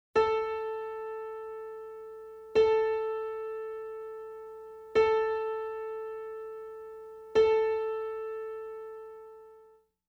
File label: Tuning Note A 440